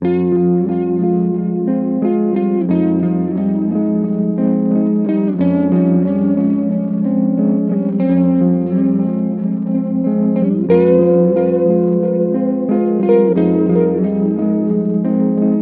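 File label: guitar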